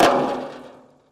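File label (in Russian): короткий звонкий резкий звук удара по металлу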